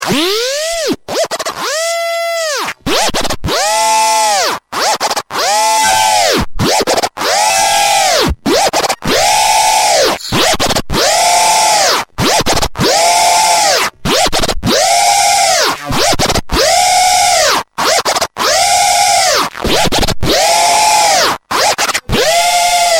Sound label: Propeller Lead, HardSID